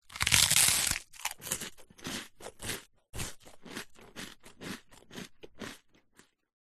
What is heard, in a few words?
human eat bite crunch munch toast; zapsplat